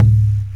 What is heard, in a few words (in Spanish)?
Bombo Y Sine